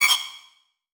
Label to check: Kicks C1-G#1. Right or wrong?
Wrong